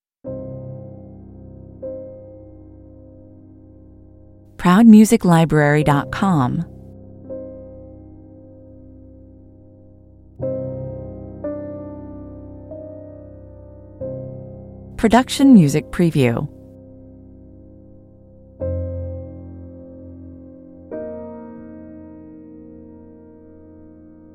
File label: piano only